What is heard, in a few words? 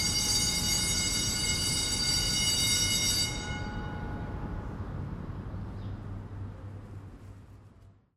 symbol win scatter; common effect